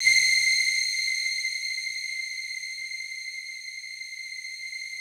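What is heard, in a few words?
Rough Strings C6, Yamaha VP-1; Music Store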